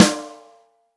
ROCK SNARE CHROMATIC, Real Drum Kits; Best Service ProSamples vol.43

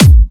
Dubstep Kicks

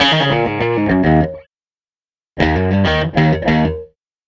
Strat Guitar Loop

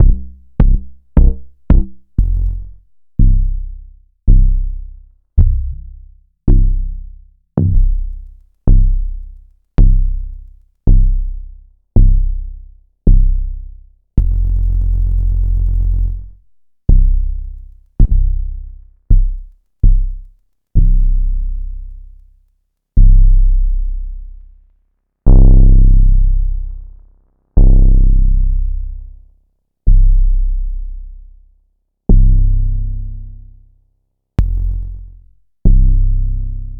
808 Experiment

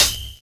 SONAR BEA; Univers Sons - Kitch 70s

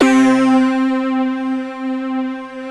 ARP, Analog Fantasies; USB Soundscan vol.49; AKAI

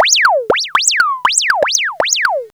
arp seq 120; AMG - Vince Clarke Lucky Bastard

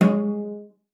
CELLO FINGER G#3; Xsample VOL-5

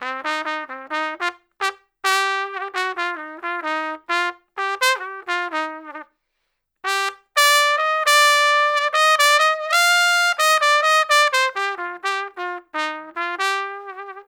Trumpet Solo, Jazz Traditional

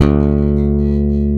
YK BASS D-2, Bass Guitar; AKAI S6000 CD-ROM - Volume 1

